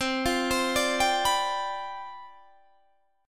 C Major 7th Suspended 2nd Suspended 4th C down Guitar Standard, chord; CM7sus2sus4 arpeggiated; JGuitar